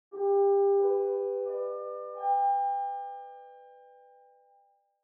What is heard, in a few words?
Chime Announce